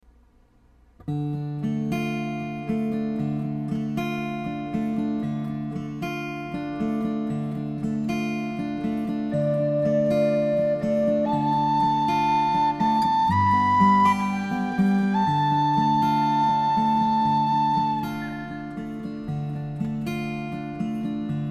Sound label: guitar and tin whistle